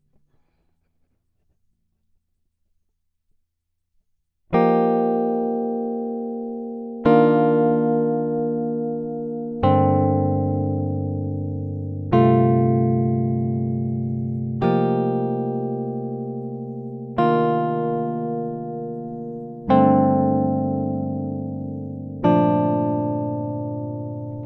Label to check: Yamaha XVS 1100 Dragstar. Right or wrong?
Wrong